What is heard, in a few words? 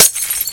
glass